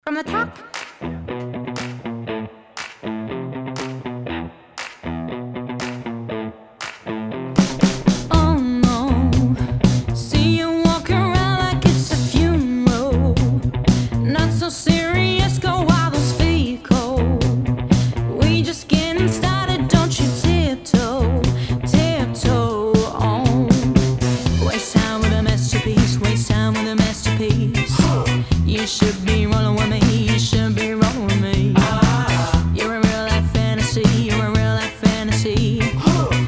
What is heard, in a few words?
Rock Pop Band; Bristol